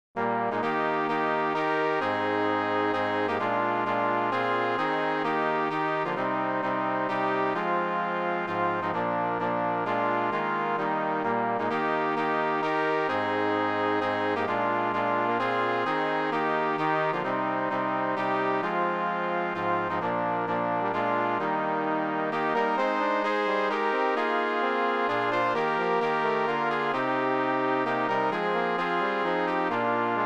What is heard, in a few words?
midi